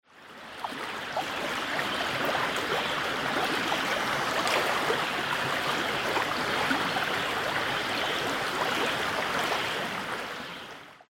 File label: water